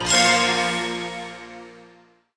FANFARE; PHARAOH

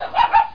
DOG

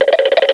Rattle; Princess and the Pea; SoftKey

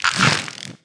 winged scarab hit

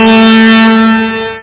organ

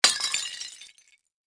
Glass; zones; crime

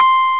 Rhodes (C5); X-Wing; X-Wing Patches

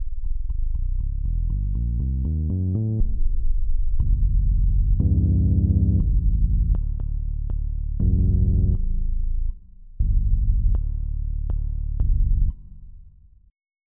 Below Sub; Onj's Soundfont

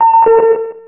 New Voice Mail; firetalk

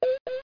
radar; Software Explosion; Fore-Matt Home Computing; winfish

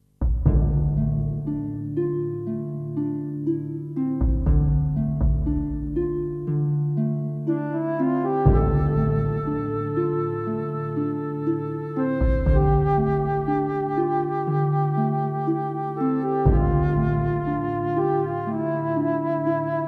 Ambient; Imperial Glory

